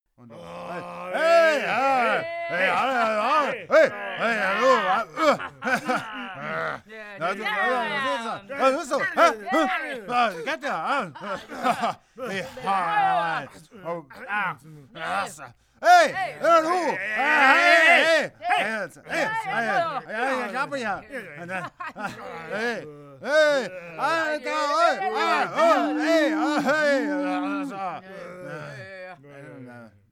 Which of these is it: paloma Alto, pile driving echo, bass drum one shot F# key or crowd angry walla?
crowd angry walla